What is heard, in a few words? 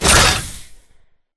Mvmt Servo Jump; AI Stalker; Titanfall 2